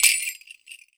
MIXED PERC; 90 s Sample CDs

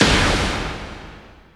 BANGS; Megabass Remix! 2

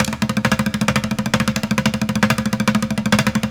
Street Groove, Ambient Grooves

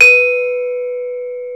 BRONZE, Bronze Gamelan

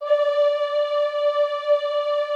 D4, Classical Choir; Best Service; Extended Classical Choir

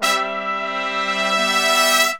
100, BRASS SAMBA

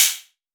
hiphop kit; Wizoo - Platinum 24 Electronic

